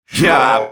Grunt Attack; Grover; Paladins

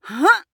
Mage Grunt Slow; Realm Royale; Kunoichi

